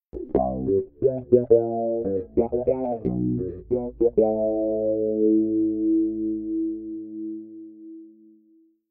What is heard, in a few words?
bass flanger; trilhas para filmes